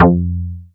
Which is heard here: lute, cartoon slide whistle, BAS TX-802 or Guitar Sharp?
BAS TX-802